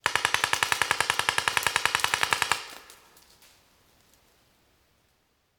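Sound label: Paintball Gun Impact; Sound Ideas; Guns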